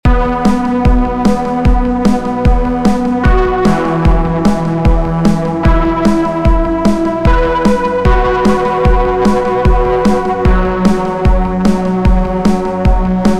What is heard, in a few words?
Dark Wave Loop